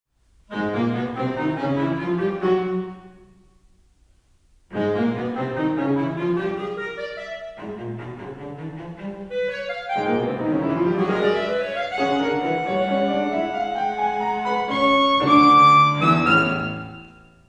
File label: piano trio